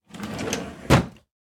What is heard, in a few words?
shelter storage open; Original TK Sounds